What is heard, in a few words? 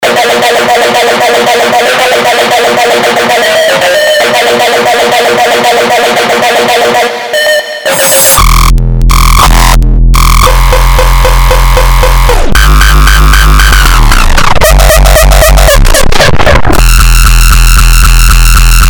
UPTEMPO KICKS, ハードコア系サンプルパック; Industrial Strength Ikaro - Uptempo Kicks 3